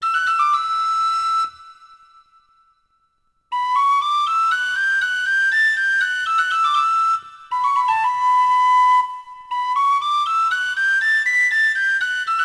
township wright; Sid Meiers Pirates; XBOX

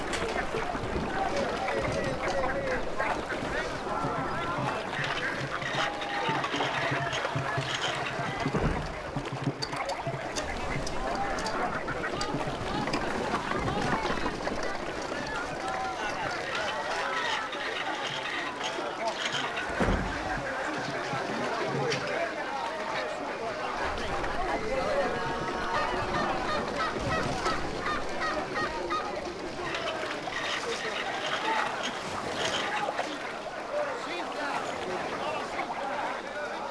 merchant amb bed; Sid Meiers Pirates; XBOX

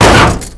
hit mtl; WhiteOut; XBOX; bokujo

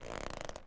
rope swing

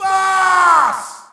Shout, audience; UFC Tapout 2

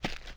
TIRE STEP; Cars; XBOX

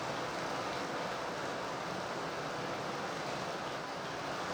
rain window; prague; Soldier Of Fortune II Double Helix; XBOX; ambience

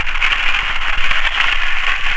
Scrape Rock; Finding Nemo; XBOX

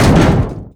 Metal Fence; Totaled; XBOX

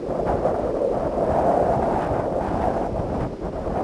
Wind Loop; XBOX; Amped 2

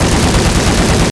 Helicopter Cannon Loop; Max Payne; penthouse

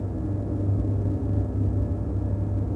power idle ambience; Star Wars Obi Wan; XBOX

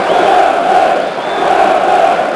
Chant, Crowd; David Beckham Soccer; XBOX

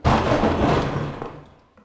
smash muffled; Max Payne; nightmare

